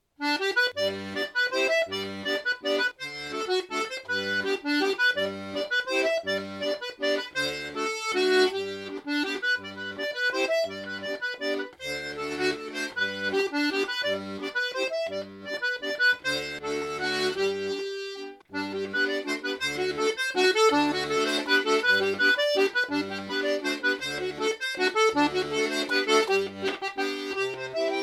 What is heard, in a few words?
mazurka